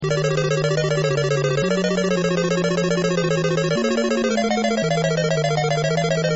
Hurry Castle; mario

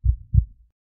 heart; slope-ball

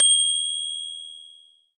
bell; thereisnogame

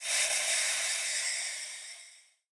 bullet can; gunknight

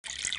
water; factory balls forever

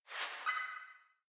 hits; skibiditoiletattack

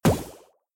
destroy; skibiditoiletattack

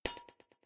fail; skibiditoiletattack

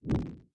stoke fire; adarkroom

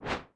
weapon melee; adarkroom

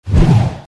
menu; flappycopter

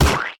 slug; gunknight

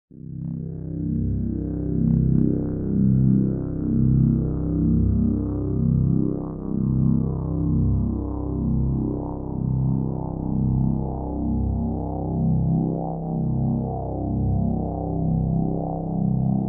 music start; thereisnogame

